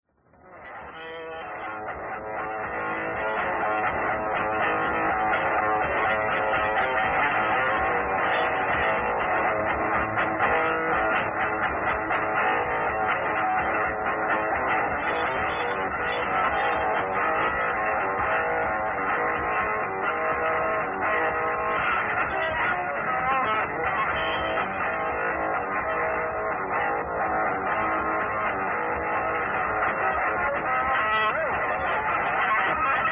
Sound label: Guitar, Live; Leicester 75